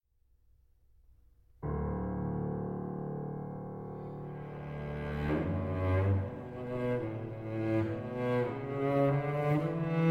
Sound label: Cello Sonata No.1 in D Major I. Adagio - Andante